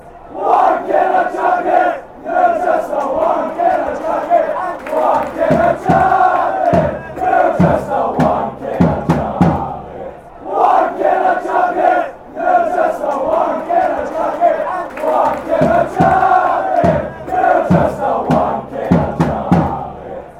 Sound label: wnk jacket, fan chants